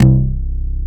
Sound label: Korg CX3, C 1; Back In Time Records; Korg Universe VOL-1